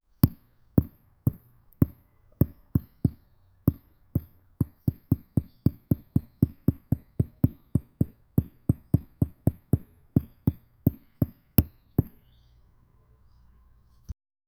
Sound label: spinning basketball on